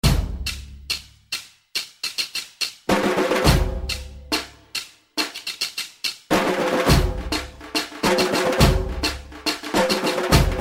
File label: South Indian Beat